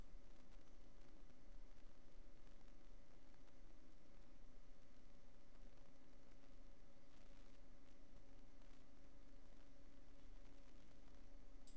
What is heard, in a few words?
silence neutral flat; Netophonix